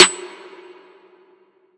Snare - F#; trap; Snares; Iconic Snare; Cymatics; Trap Starter Pack

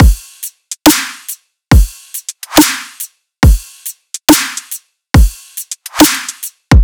Riddim Drum Loop Full (140); Drum Loops; Ghosthack; Riddim Essentials 2020

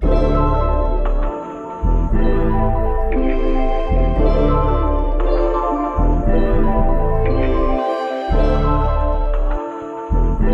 116 BPM A Min, RnB; Bad Ideas; Cymatics; Destiny Premium Melodies (BETA)

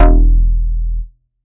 Bass E, techno synth shot; Bass One-Shots; Ghosthack; Techno Synth Shots 2025

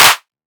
clap oneshot; GhostHack

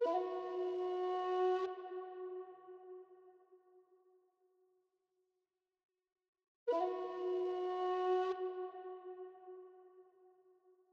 flute, 144 BPM E Min; Stems; Cymatics; High Ground

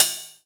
Ride, Synthesized, Ride Cymbals; Drums; One Shots; Ghosthack; Drum Mega Bundle; Drum Hero 5; Flare

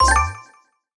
Collectibles Classic FX

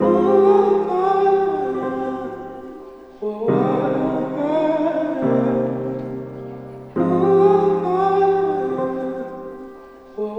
138 BPM F Min, Compositions; Cymatics; Zodiac Vol 2 Beta Pack; Zodiac Vol 2 Premium Compositions

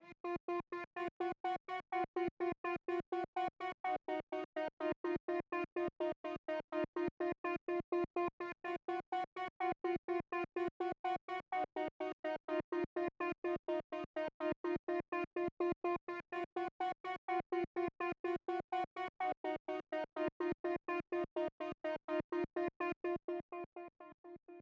125 BPM A# Min Strings; Melody; Loop Stems; Cymatics; 2022 Melody Collection; Wavy; Power

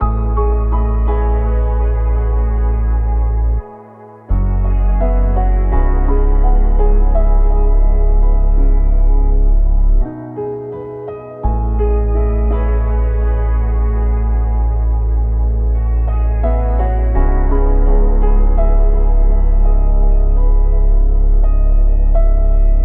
Kit Full C#m 84, Lo-Fi; Melody Kits; Glow; Ghosthack; HipHop

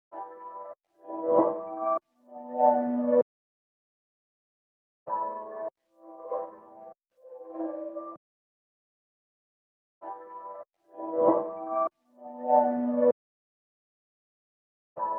97 BPM E Min Atmospheric Chords; Melodies; Loop Stems; Cymatics; 2022 Melody Mystery Expansion; Sleepless Night

